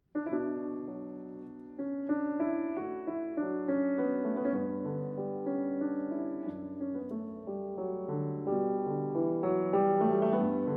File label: Transcr. For Piano; Sì Dolce è'l Tormento, SV 332